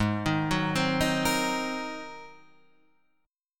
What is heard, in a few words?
Ab 9th Ab down; Ab9 arpeggiated